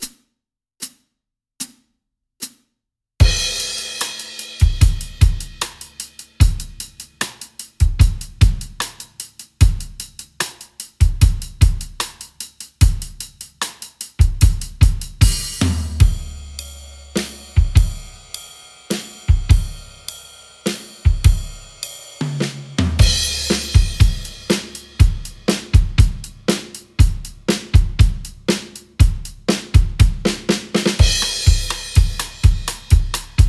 variety pack country

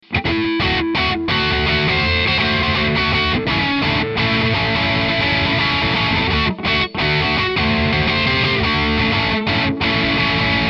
crunchy riff

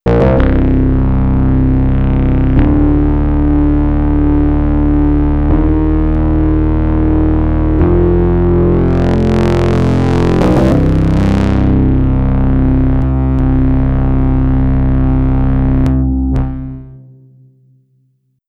fm burning bass

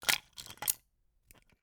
construction tool

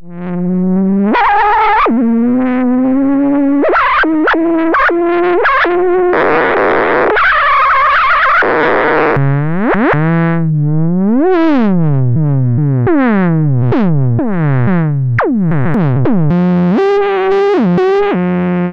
blowfly

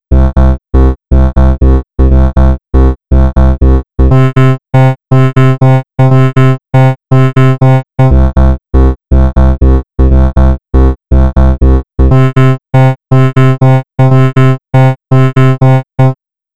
programmable wavetable

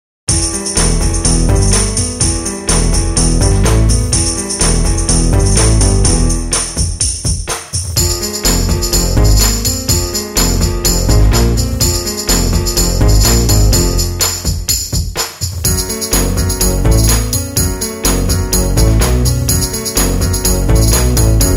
all tambourines

